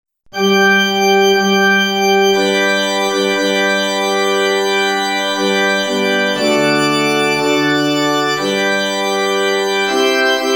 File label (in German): G-Dur Church Organ